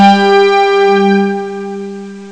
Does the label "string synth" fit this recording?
Yes